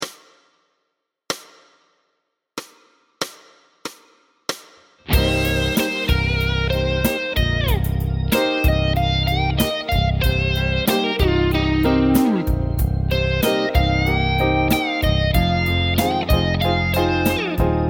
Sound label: minor Blues